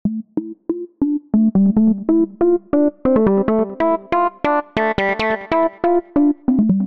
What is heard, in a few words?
Pluck; PatchFactory